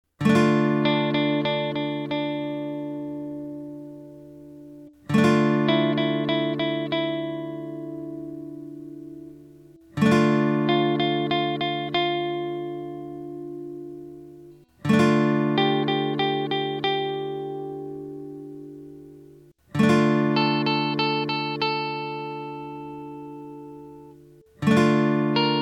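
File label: minor scale tones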